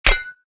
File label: imp bullet light ballistic titan; Titanfall 2